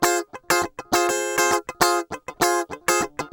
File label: GUI 100 BPM